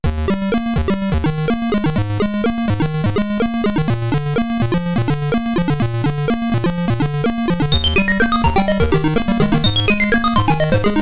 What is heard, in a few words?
In Game Bgm; Chronicles of Omega; atari st